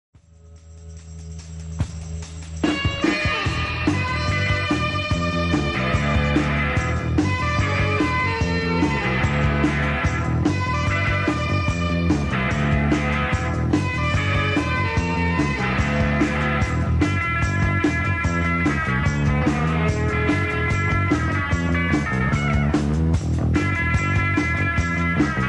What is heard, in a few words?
polka